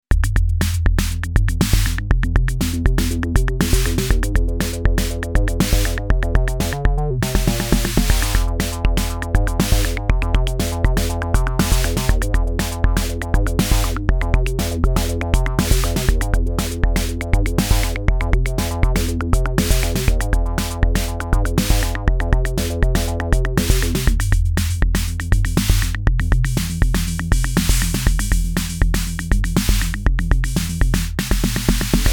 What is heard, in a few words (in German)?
Akai Rhythm Wolf kurze Tweak Session